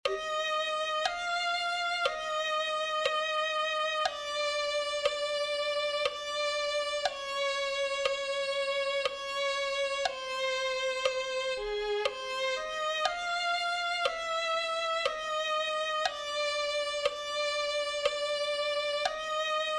purifica oh dios violin

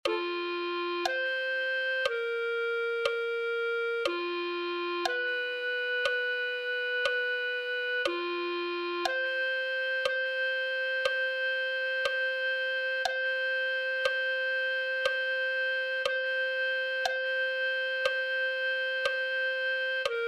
clarinete

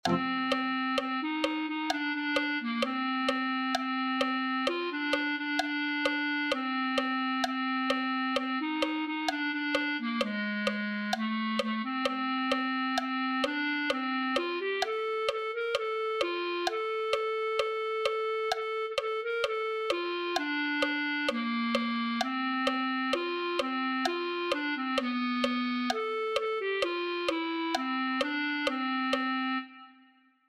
deja ir a mi pueblo clarinete

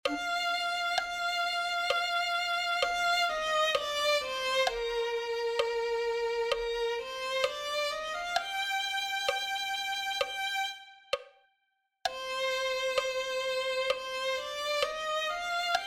lo he visto y conocido violin

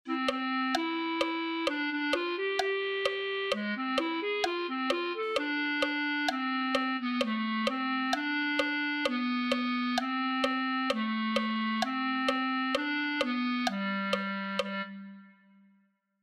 en el señor me gloriare clarinete

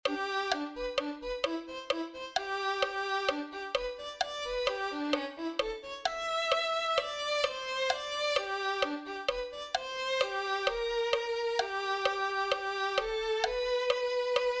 violin

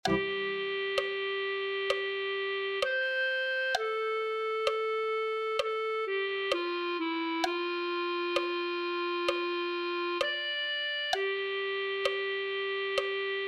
clarinete

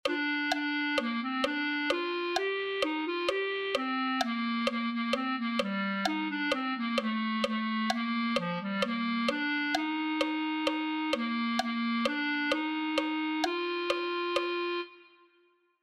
clarinet1

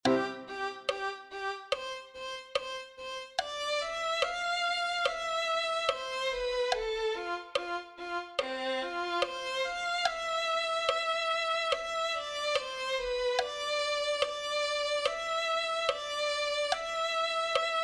santo santo santo violin

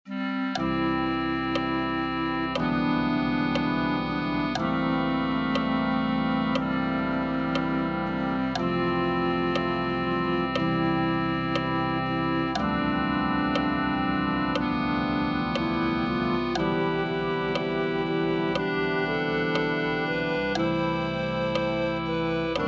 clarinete

